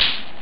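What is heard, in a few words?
closed hi hat; jazz jackrabbit 1; M8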